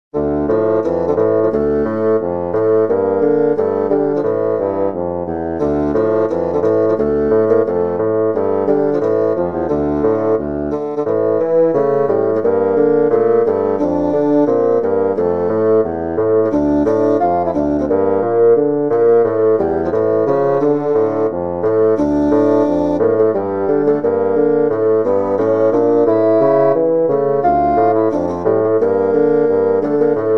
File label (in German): Fagott